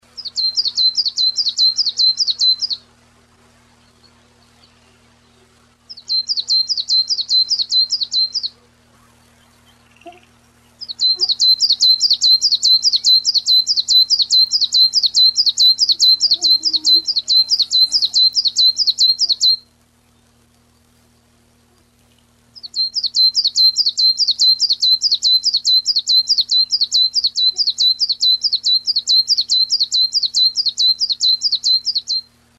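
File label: Phcollibita, Phylloscopus collybita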